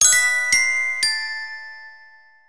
Neon, ringtones; Explay A400

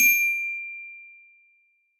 Doors, notifications; Lenovo; ZUI 2

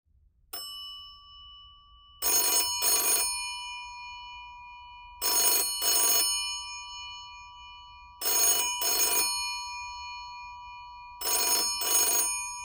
Antique Phone, ringtones; BQ Russia; 5045L-Wallet

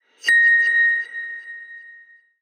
Hit, notifications; Lenovo; ZUI 2

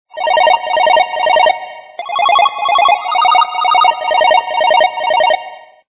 Ring; BQ Russia; BQM-1404-Beijing

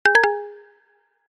Bling Bling, notifications; Gigaset GS290

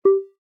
volume key; LG Qua tab PZ LGT32

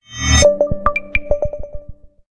Future Tone, notifications; Honor Magic-7-RSR-Porsche-Design

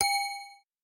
Discreet; Huawei U8160 Vodafone 858 Smart

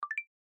Usb Effect, ui; Coolpad 1827

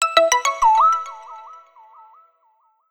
notification; Vivo; X1

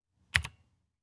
lock unlock; Smartisan OS 0.4.0

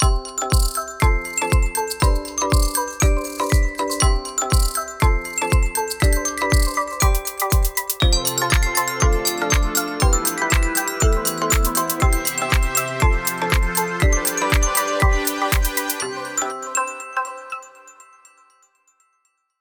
Vibrant Beach, ringtones; Honor Magic 8 Pro

